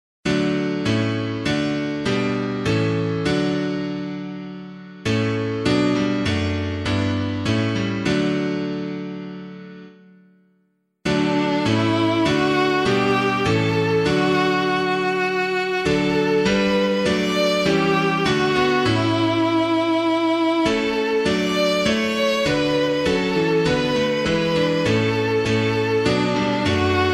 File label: piano